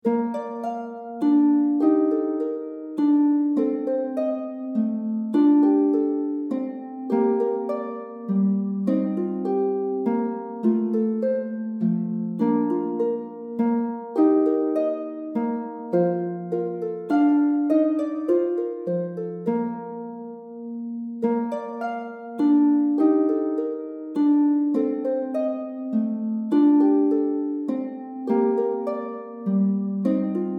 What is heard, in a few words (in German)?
Menuett; Scarlatti